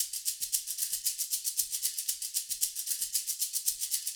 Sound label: Disco Maracas, 70s Analog Funk; Sonic Foundry (Sony Creative Software); What it Is! 70s Analog Funk